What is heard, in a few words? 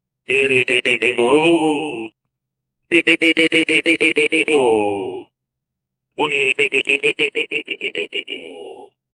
rat voice